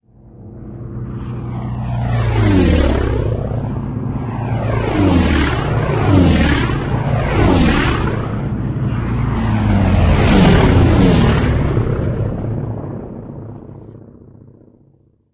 flyby; ambience; Source Engine; dod

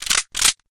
sticky bomb launcher world reload, weapons; tf

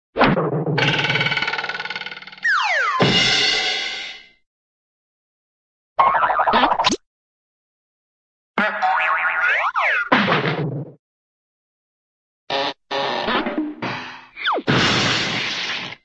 cartoon step on rake and fall, hit

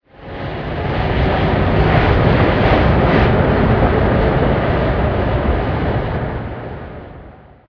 truck pass distant; ambient; Source Engine; video game

